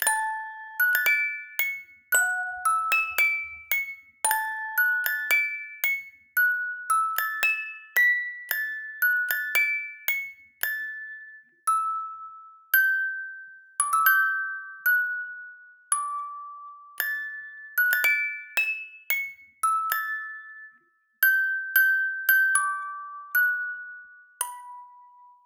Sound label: musicbox, 113BPM